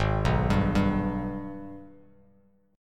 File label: Ab Minor Major 7th, Bass Standard; arpeggiated; JGuitar